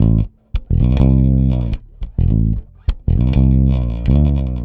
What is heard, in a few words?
Rock, Bass; Bass X